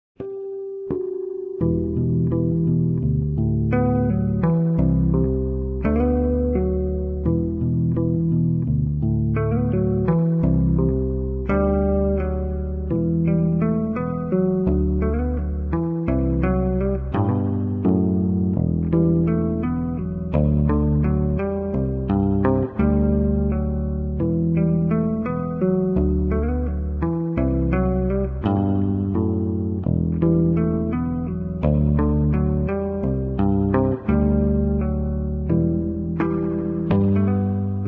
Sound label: Guitar Electric Bass; Zomboid; Lifestyle